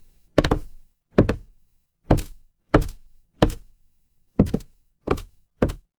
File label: footsteps wood